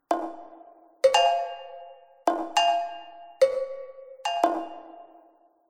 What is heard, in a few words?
Rain leaks